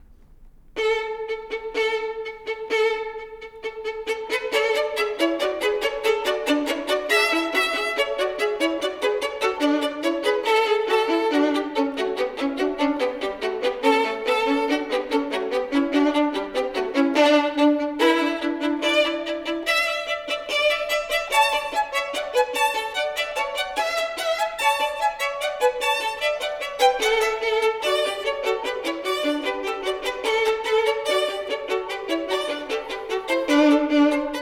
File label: Duos for Two Violins, Pillow Dance